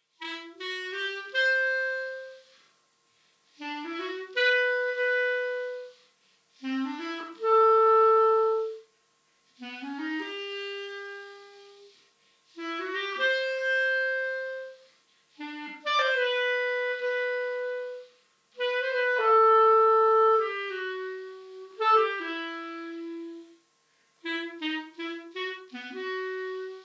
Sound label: Clarinet